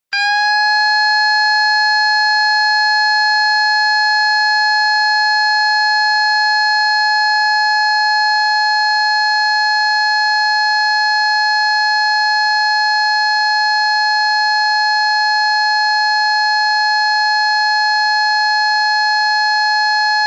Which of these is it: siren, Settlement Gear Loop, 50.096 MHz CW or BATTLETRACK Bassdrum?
siren